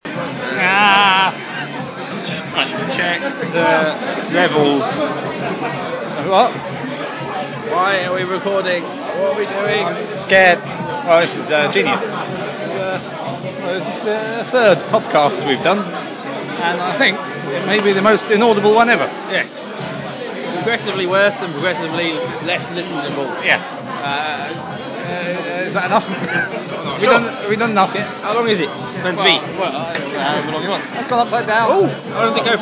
pub

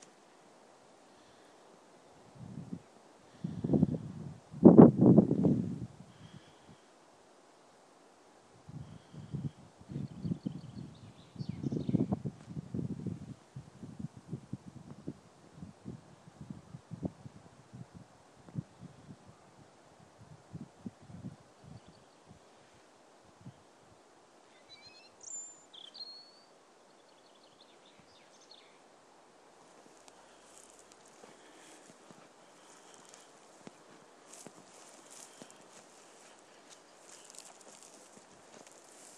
newborough forest

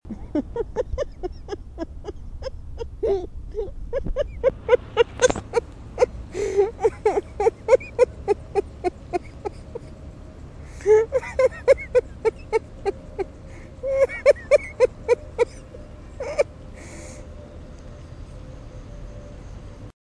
laugh library

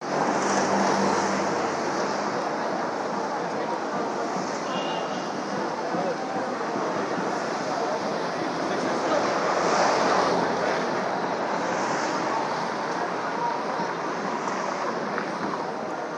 ambient sound Yonge Street at Dundas

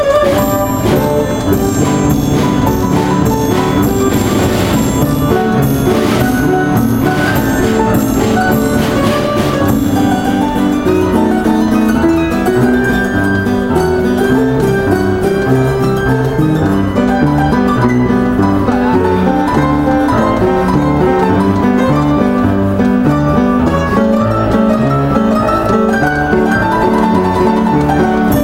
tinkling piano

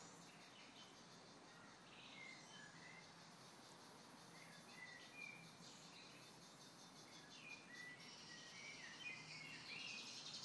spring has sprung birds ambience

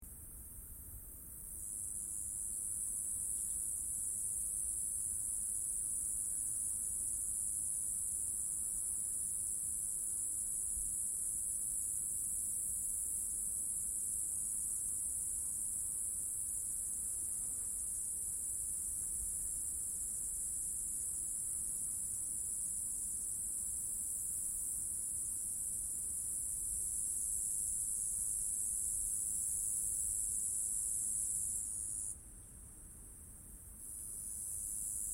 cicadas continuous song, Corsica

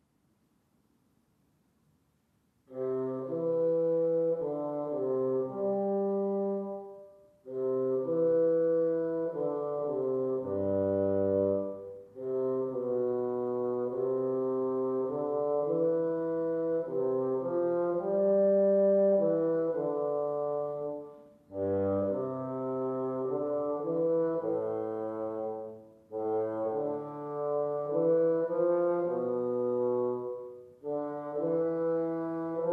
bassoon studies; julius weissenborn

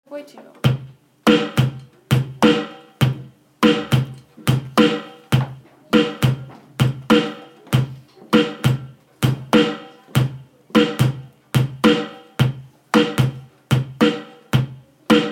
playing drums

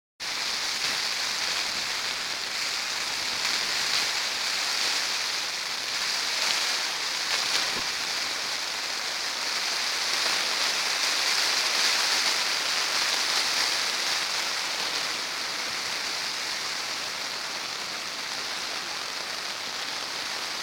rain falling onto tent roof

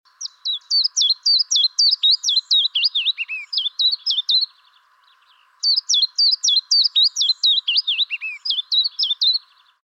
ringtone willow warbler

